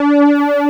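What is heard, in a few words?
JP-8 STRING L; Greytsounds VOL-1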